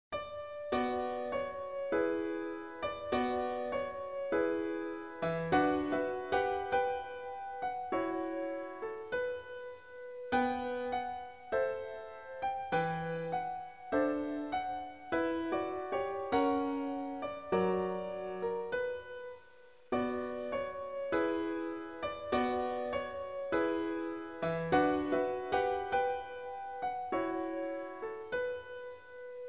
menuet

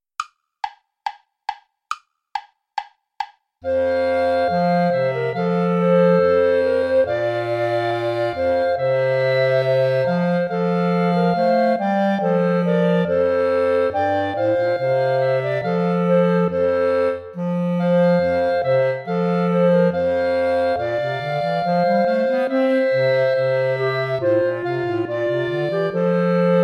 ballet play along without clarinet; praetorius michael

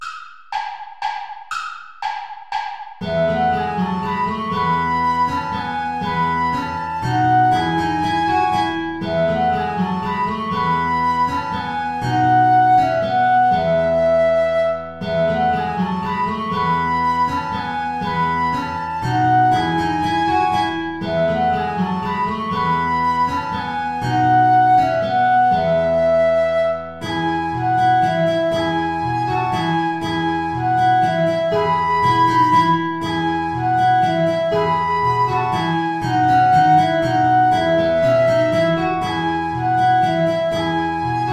saltarello play along without guitar

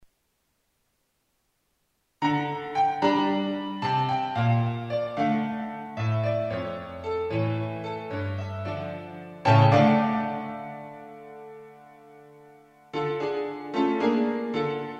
tango; tango derria les fagots

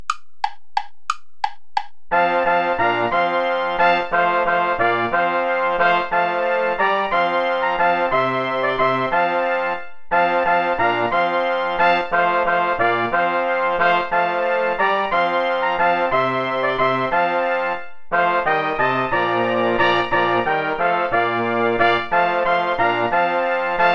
play along without trumpet

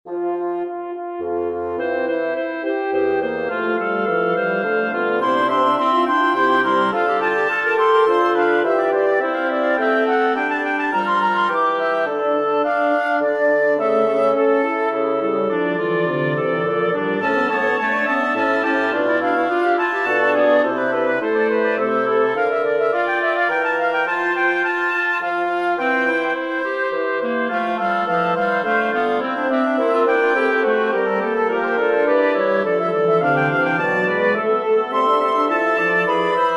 for wind quintet; bach johann sebastian; chorus lasset uns den nicht zerteilen

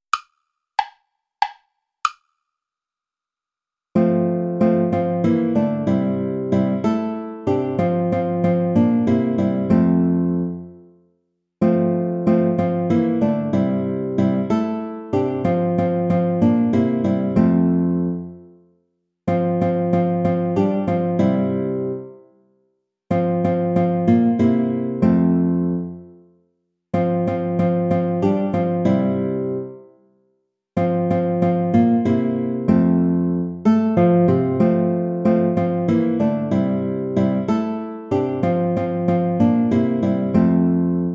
traditional play along without guitar; asikhatali

